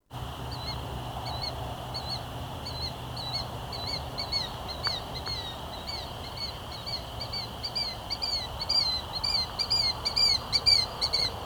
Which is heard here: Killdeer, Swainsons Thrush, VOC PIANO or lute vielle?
Killdeer